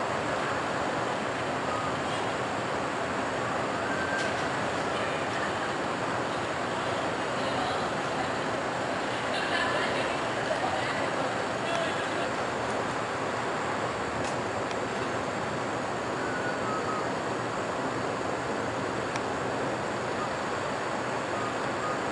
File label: american redstart